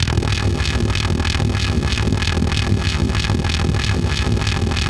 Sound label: VOLTAGE; AKAI Anime Sound FX; SCI FI